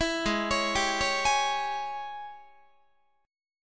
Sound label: Ab Major 7th Suspended 4th Sharp 5th Ab down Guitar; AbM7sus4#5 arpeggiated; 19,16,16,17,17,19